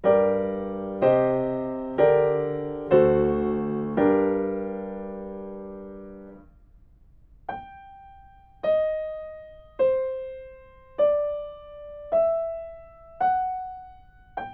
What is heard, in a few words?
melodic fragments; ear training